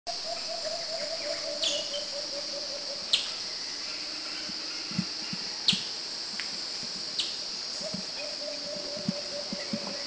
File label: Visayan Wattled Broadbill Bohol; Visayan Broadbill Eurylaimus samarensis Vulnerable (VU) Country endemic